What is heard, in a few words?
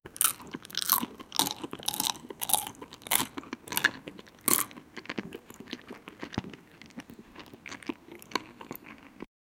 food eating, eating